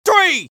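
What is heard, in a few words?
scout taunt rps exert, male voice; taunts; tf2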